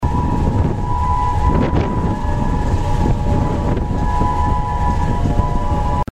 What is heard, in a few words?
Ferry Song; Astoria Trip